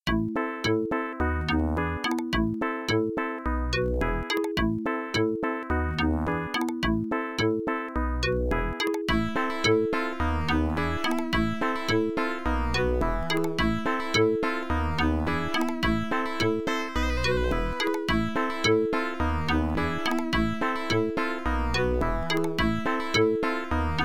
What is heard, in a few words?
Rainy Forest (Adlib); Lollypop; MS-DOS